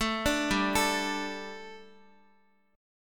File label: G Suspended 2nd G, down, Guitar; Gsus2; arpeggiated